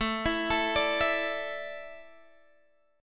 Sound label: A Suspended 4th